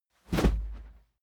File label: whoosh cloth; swooshes-swishes